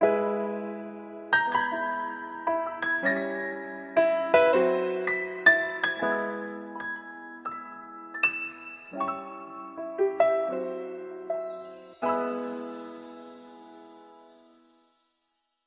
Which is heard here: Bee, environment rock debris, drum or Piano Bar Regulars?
Piano Bar Regulars